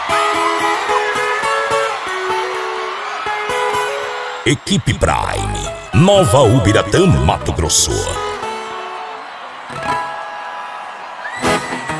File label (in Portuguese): moda de viola; frequency mix